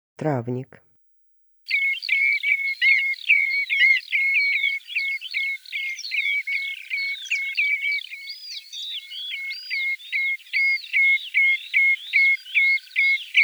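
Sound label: travnik golos, common redshank voice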